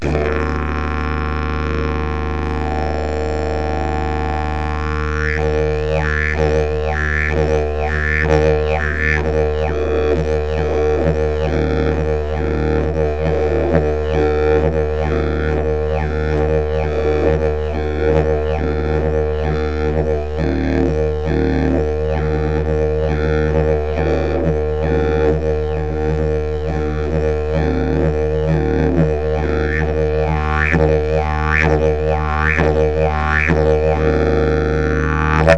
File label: didgeridoo; briza klokan